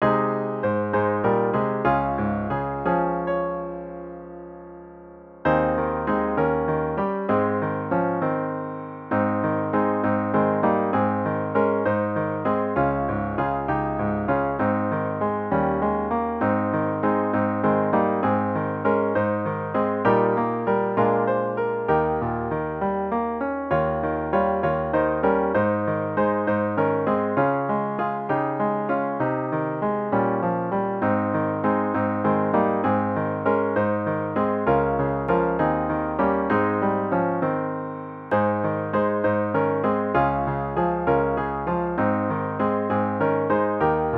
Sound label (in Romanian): Ce dulce e mesajul pian